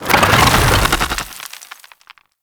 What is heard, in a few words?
Sandstorm Deactivate; Warframe; Mummy